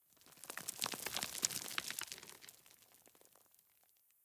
Rocks and Dust Movement; The Town; 3D Environment

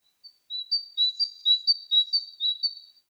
ANIMAL Bird Singing Spring mono; adams venture origins

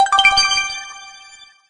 menu confirm; edge extended; android gamerip 2012

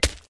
Sand; doodle jump 2; android

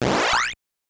Opponent throwing an Uppercut; Mike Tyson's Punch-Out

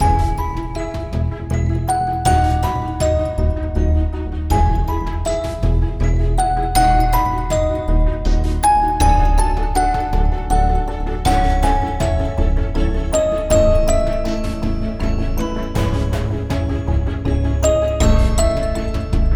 Window Raindrops; chipper and sons lumber co

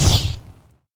Fire Bolt Shoot

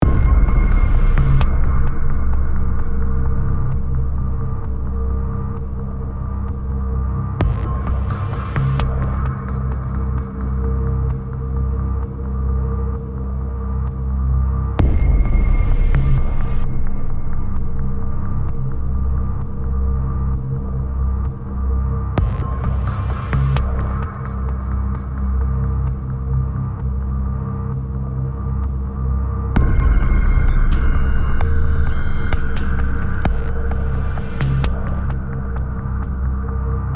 Hacking AMB

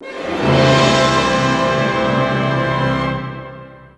New Level Unlocked!; madagascar; ps2

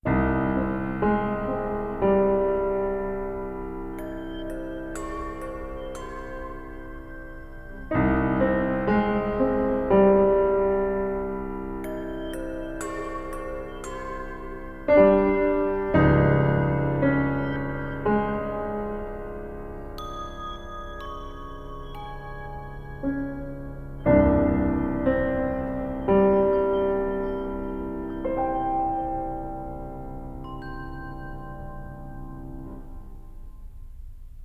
Piano; Identity V

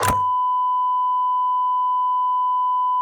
TV Jump Scare; The Inn

